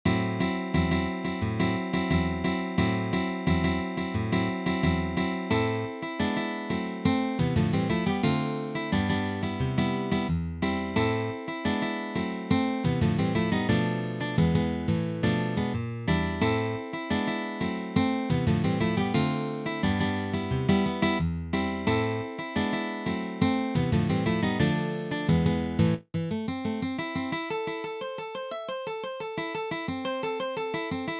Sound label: Danza Brasilera